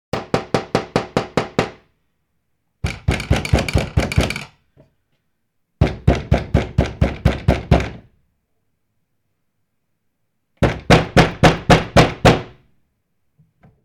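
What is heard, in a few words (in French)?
Frapper à une porte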